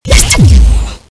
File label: canon fire; Predator Mod